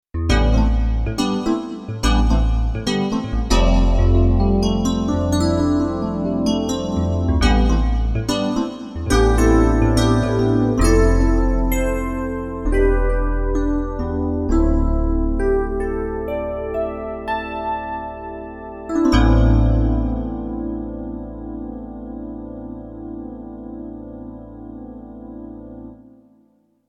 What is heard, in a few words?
Crystal EP